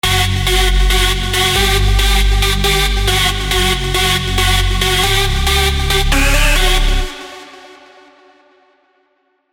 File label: Melodies F# (138 BPM); MIDI ANTHEMS